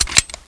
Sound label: glock clip out